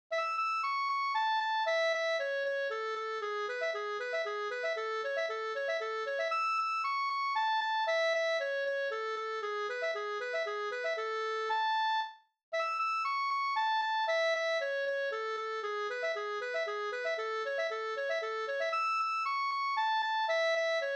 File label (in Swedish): mazurka